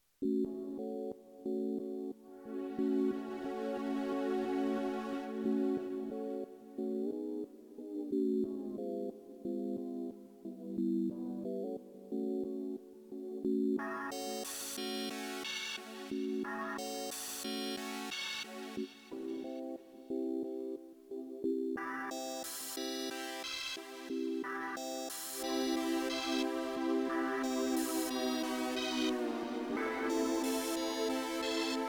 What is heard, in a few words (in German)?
Yamaha Montage 8 pad sequenz